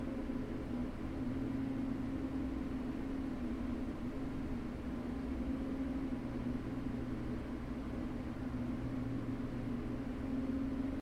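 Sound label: calm light long sound of production work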